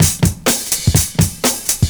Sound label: CYMB, Breakbeats